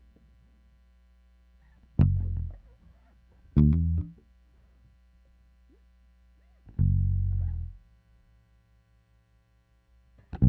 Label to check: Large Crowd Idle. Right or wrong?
Wrong